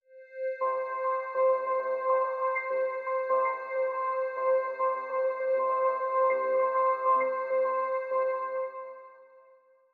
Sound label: bell orchestra; bespoke - Floral v.1